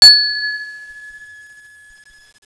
chime

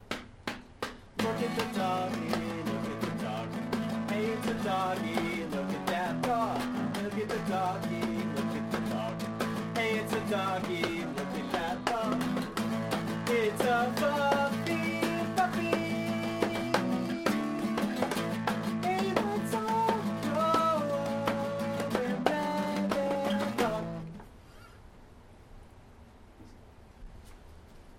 dog song

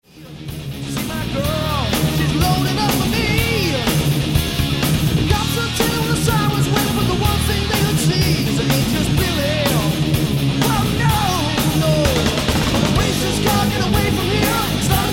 scream

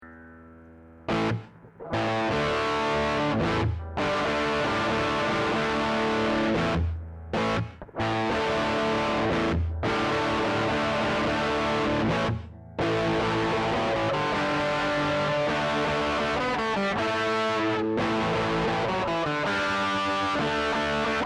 electric test

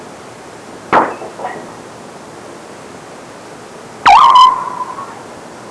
bedroom noise

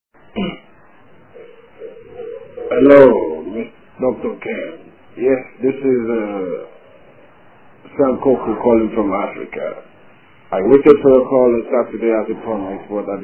Yawn

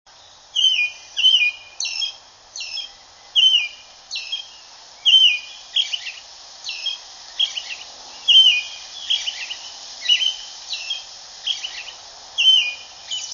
robin with thrush-like sqieals; birdsongs

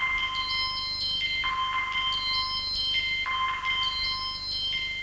medscan; startrek

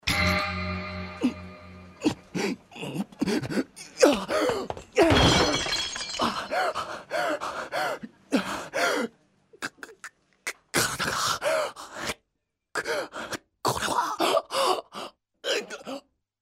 Rath dying in the restaurant

